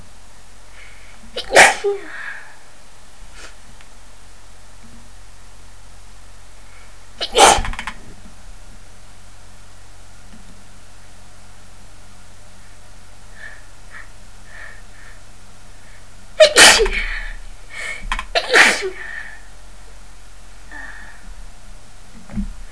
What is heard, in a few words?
caught cold, female